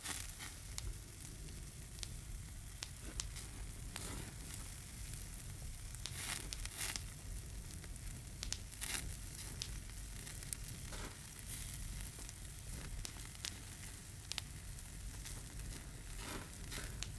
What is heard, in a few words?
ambience room butchery base layer loop